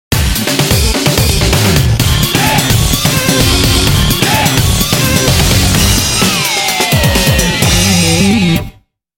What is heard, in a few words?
GROOVE; DEAD HEAT GROOVE #1; Dead Heat Riders